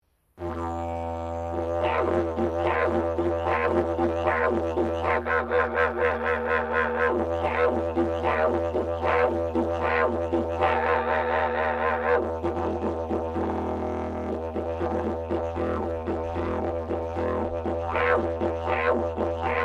Didgeridoo Traditional Straight Piece, Traditional Australian Aborigine didgeridoo; Traditional Australian Aborigine didgeridoo designs; Miscellaneous Musical